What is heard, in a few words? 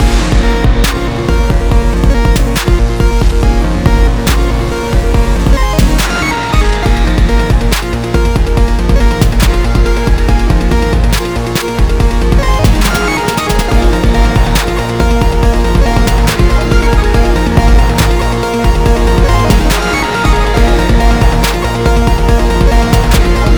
BOSS BEAT; high hell